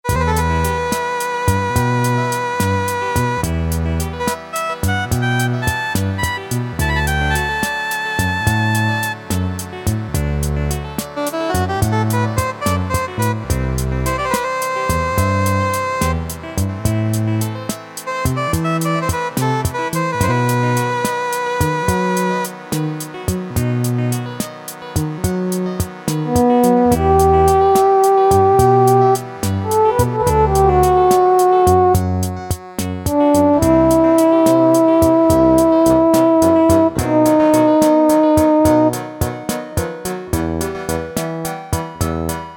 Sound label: performance, Casio MT-68